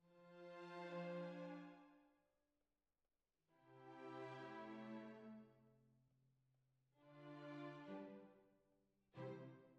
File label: Flute